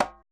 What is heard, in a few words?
tom, Roland MC-909